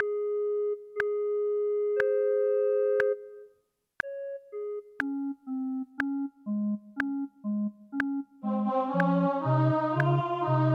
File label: O clap your hands; Gibbons